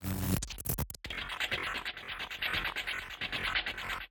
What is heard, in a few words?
ui glitch mixed glitch; Sonniss GDC